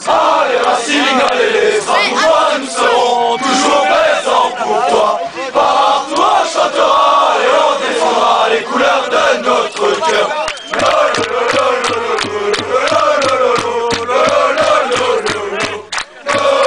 chant, fan chants; tetris

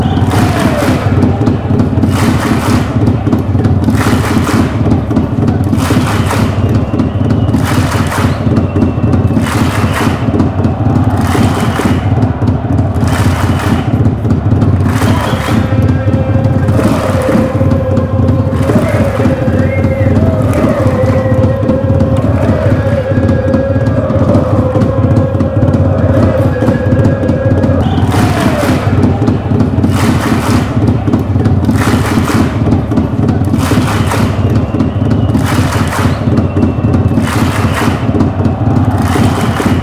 samba, fanchants

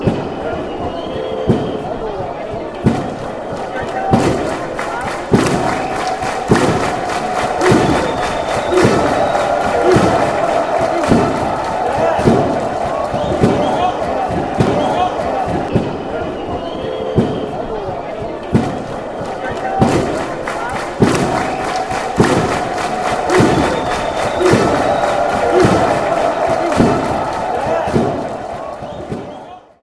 drum clap war cry

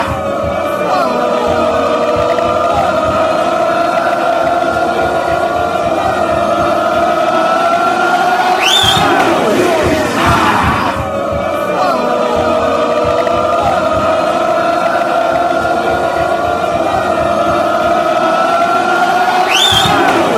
goal kick, chants; bfc

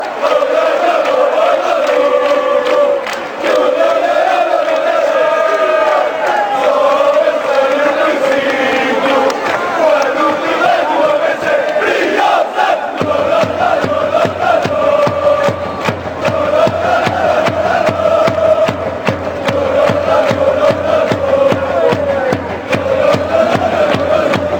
lo lo lololo, fan chants; coimbra

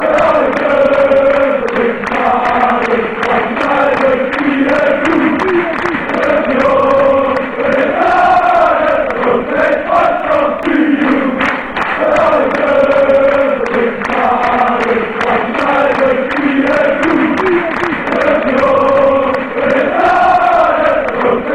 ajax chant

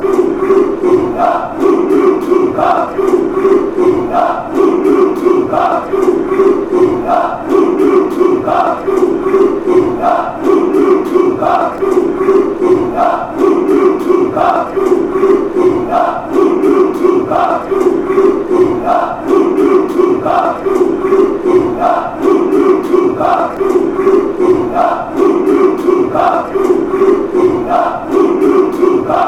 club club, chants; Real Sociedad de Fútbol is a Basque Country based team from the city of San Sebastián

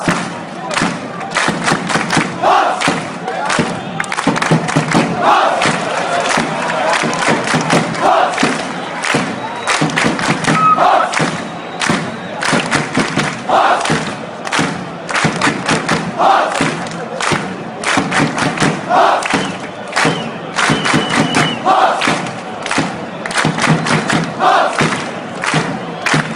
heart fanchants

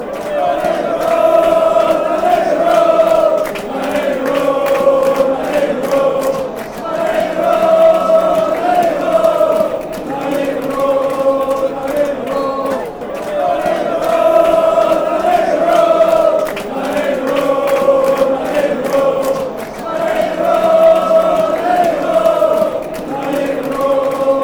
9 row, fan chants; dcfc

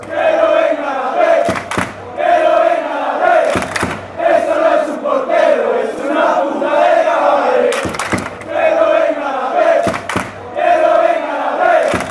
fan chants

